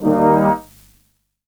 Horn Stab

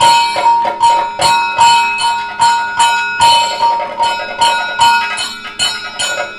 KATAK, Indian Traditions

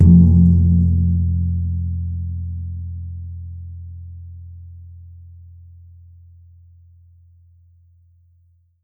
besar, MIXED GONGS; WORLD INSTR 2; WORLD PERCUSSION

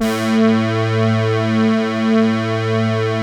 Deep Bass a2, Korg EX-800

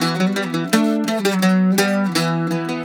Celtic Flavours; USB Soundscan vol.42; AKAI

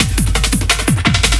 loop, 170 BPM, drum & bass

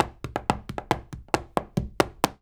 133 STEPS, Spanish And Gypsy; Soundscan vol.59; Spanish And Gypsy Traditions; AKAI

